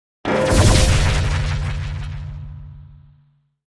Impact Metallic Thud

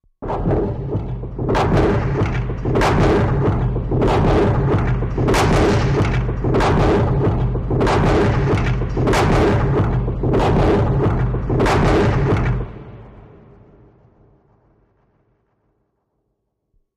The Rig Machine Deep, machines winches

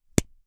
ink pen cap; ink pens

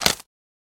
Bone Crab Shell Break

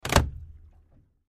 Boat Throttle Movement

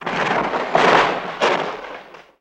Car Bottoms Out Roll

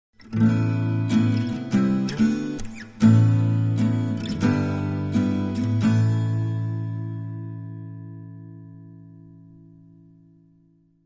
Acoustic Guitar Ballad

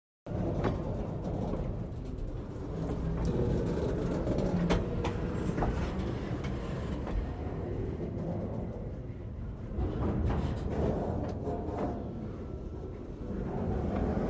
Train Coupling, steam

